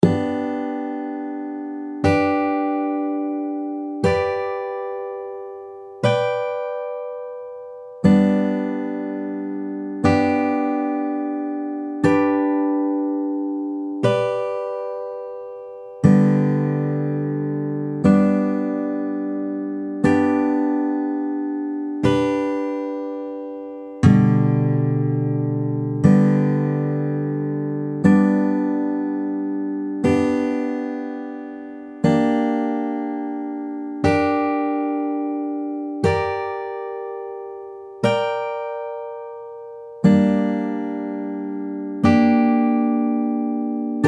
Major Triads on different string sets, guitar; Major & minor Triads on Adjacent Strings

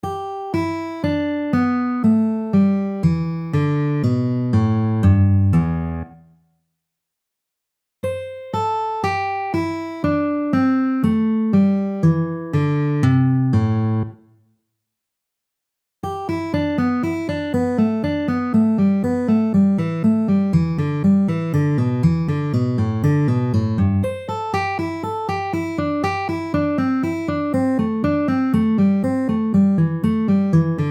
Pentatonic Cascades, guitar; Pentatonic Cascades in G/Em and C/Am

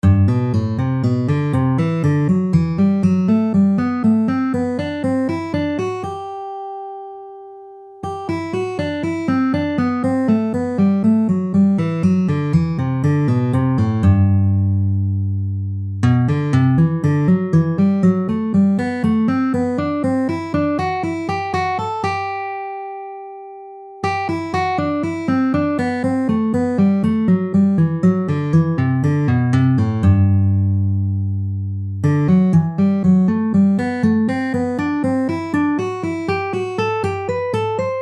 G major scale in Thirds, guitar

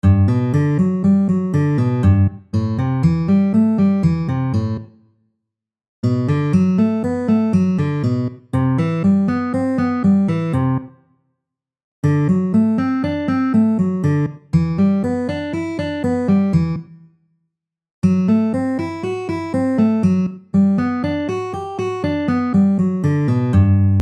G major scale chord arpeggios, guitar

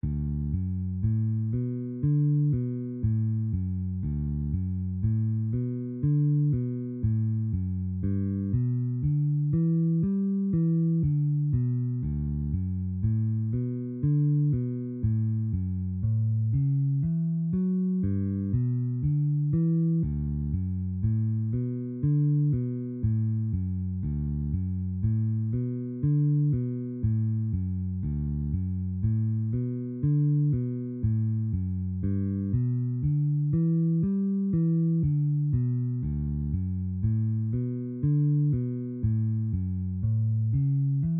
Walking Bass in D, bass; Walking Bass Line in D; Beginning Bass

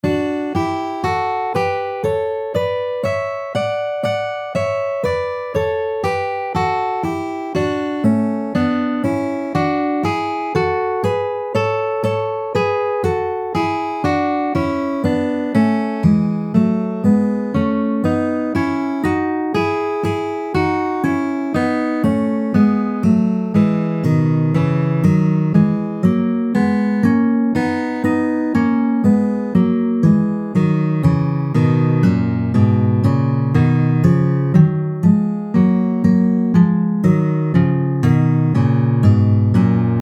Major and minor Third Shapes, guitar

